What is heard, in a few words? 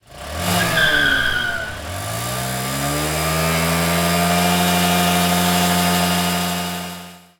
Full throttle; anemoi